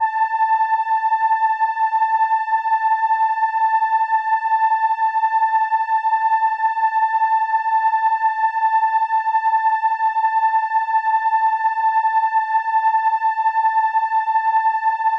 Horn A6, ARP Solina

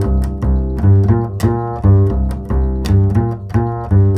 CHASE C, Bass; Spectrasonics; Bass Legends

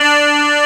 Church loop; SNES; Bokujou Monogatari (Harvest Moon)

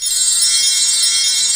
WINDER C5, Synth City

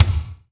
kick funk, ambient kick; Rhythm Section

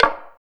WOODPECKER, FILM PERC; Drums In Your FACE!

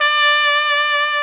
SOLI TRP, Alpine Volksmusik, TROMPETEN

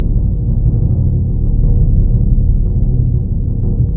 PRC CBD, Orchestral Perc; Roland LCDP03

